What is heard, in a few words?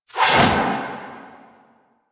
Doom Sword Whoosh; Warframe; Excalibur